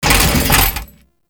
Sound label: Bodyfall